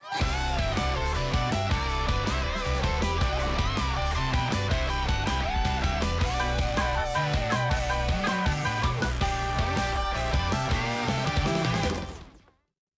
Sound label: Dance; Pira